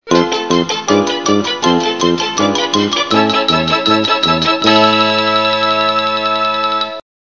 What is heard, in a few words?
music